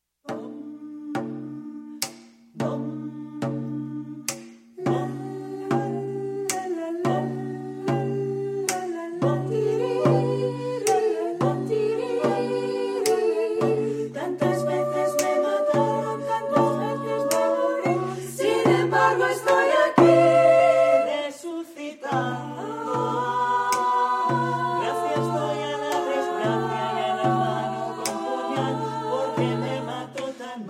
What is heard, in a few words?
choir